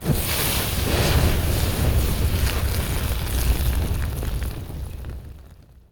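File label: Flame Sizzle Sweetener; Evolve; Goliath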